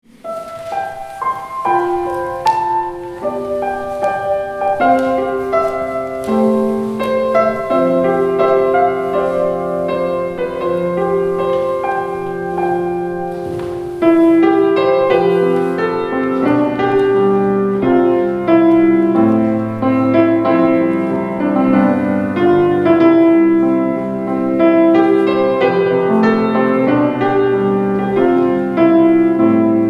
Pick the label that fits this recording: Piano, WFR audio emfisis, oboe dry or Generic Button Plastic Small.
Piano